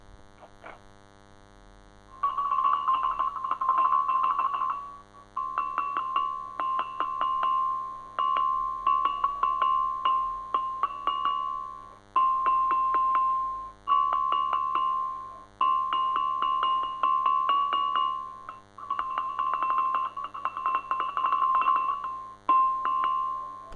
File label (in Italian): campana romana; paesaggi sonori dalpeggio